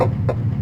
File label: Bone Rollercoaster Climb; New Super Mario Bros. Wii